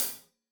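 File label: hat closed, hi-hat cymbals stereo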